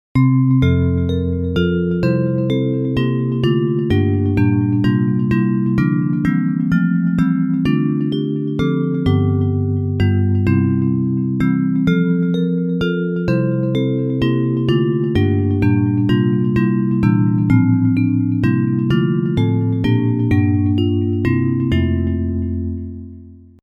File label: Bells